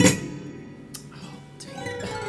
Prepared Piano; Battery 3 Sample Kits

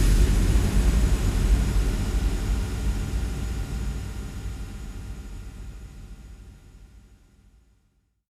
144 BPM Sub Kick; Vengeance - Essential Clubsounds Vol.5; Flight Kit Root Amin

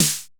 snare classic; snares

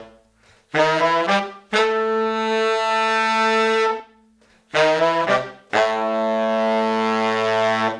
Horns, 70s Analog Funk

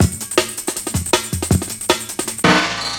Peace Sign Break, breaks

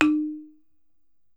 BALAFON, African Melodic

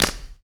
Human Perc, Body Perc; Spectrasonics; Supreme Beats; World Dance

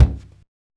African Perc

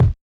BD Jungle, Yamaha DJX 2B

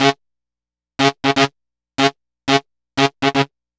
Sax Line; Dr. Fink's Funk Factory

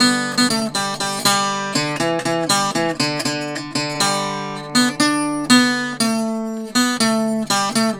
Tenor Guitar, Appalachian; Sonic Foundry (Sony Creative Software); Continental Drift Aftershocks

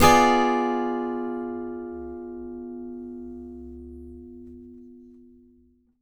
ACCGTR DIMCD, Whole Lotta Country

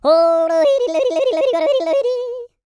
YODEL; ILIO; Vocal Planet

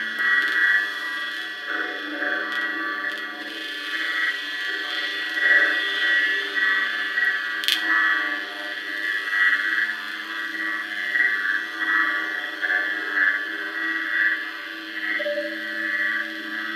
Textural Soundscape, IDM

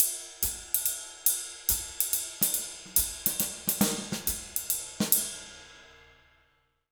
Jazz Latin Drumloops, 140; USB Soundscan vol.08; AKAI

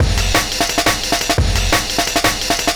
DNB BREAK

